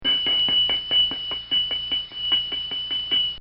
Cartoon Sound Effects Bangs

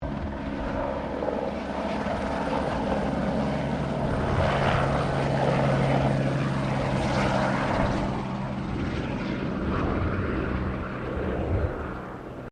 helicopter Bell 204 firefighter passing medium; Helicopters; Vehicles; Aircraft Collection

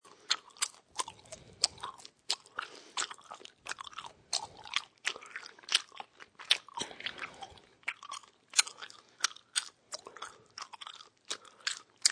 Chewing Gum

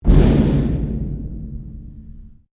Strike Metal Hollow Tank Rattle; Materials

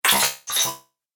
Droid Wet, robot

